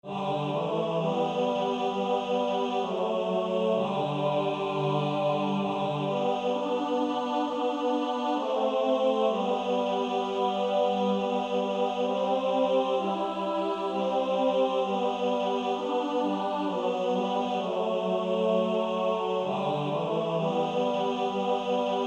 Male Choir TB

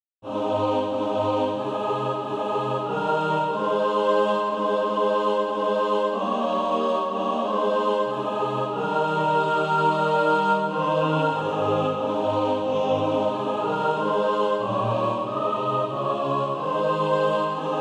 mixed

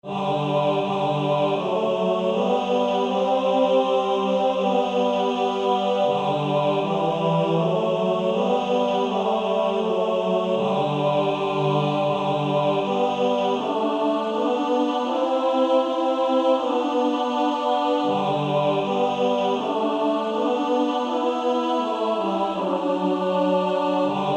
Male Choir

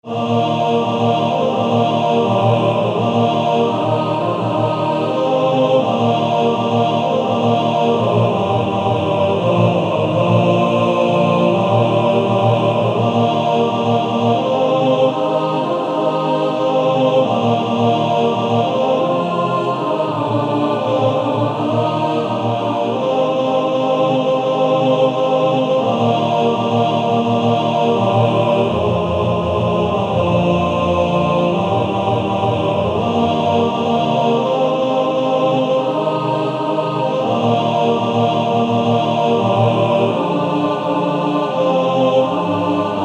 Male Choir TTBB

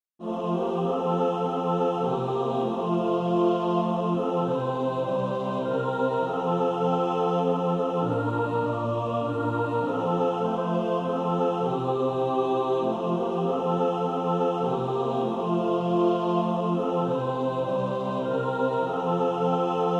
Trust and Obey mixed

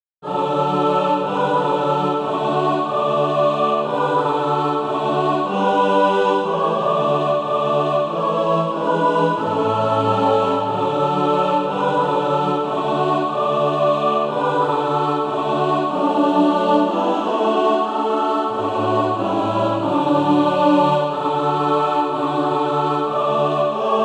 mixed

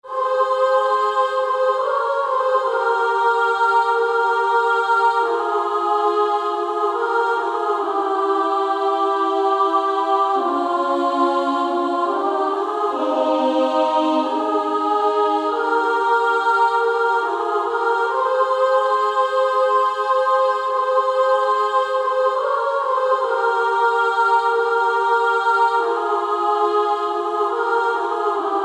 Female Choir

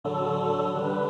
mixed; Lord dismiss us with thy blessing

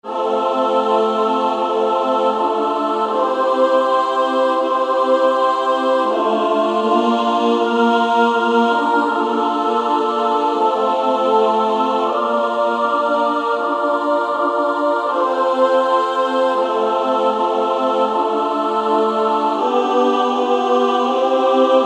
Female Choir SSAA; Nearer still nearer

